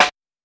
tin can snare

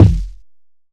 Stomach Kick; Kicks

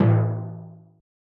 percs

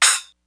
Percs

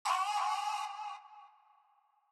Beat Starter Vox

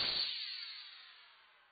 Weird Perc; Percs

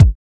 kicks

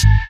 Hit

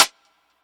rim hit latin

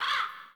Chant; Vox

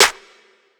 Not Nice Claps

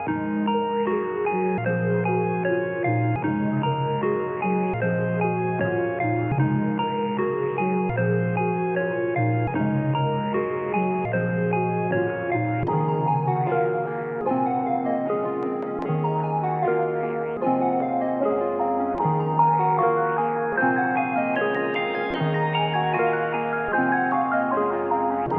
152bpm; stranger things

